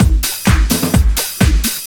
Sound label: Latin Loop 128 BPM